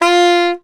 Soprano Sax Portato F3; Orchestral; DirectWave; Varazdin Orchestral

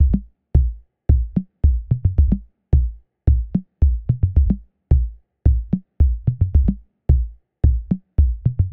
110 Low Dm, Electric Drums; Loops; Touch Loops; CosmicFunk

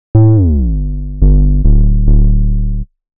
ELECTRO BASS 140 A

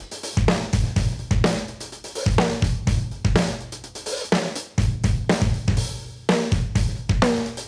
Modern Breaks; Touch Loops; ClassicBreakSessions

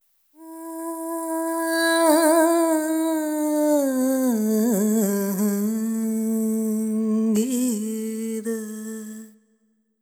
ETHNO FEMALE, Vocals; ethno2

